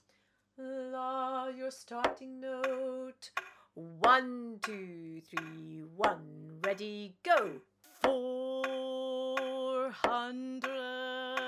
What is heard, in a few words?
Song VERSE Voice click; Voice 2; VERSE 1